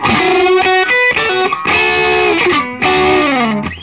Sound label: SOLO, Vintage Blues Guitar; USB Soundscan vol.22